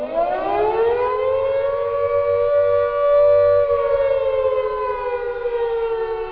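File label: air raid warning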